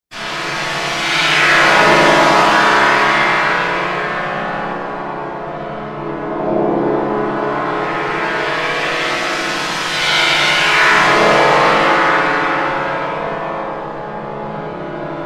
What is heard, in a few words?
Halloween Slaughter Atmosphere, Drones & Foundations; Best Of N2O Library